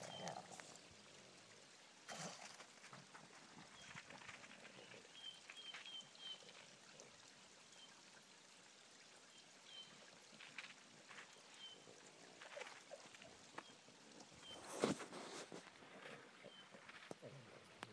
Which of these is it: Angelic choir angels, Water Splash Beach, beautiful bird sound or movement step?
beautiful bird sound